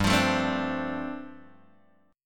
G 7th Suspended 2nd Suspended 4th, G, Guitar, Open G; down